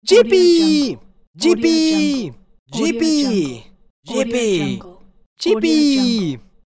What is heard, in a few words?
teenage boy yipee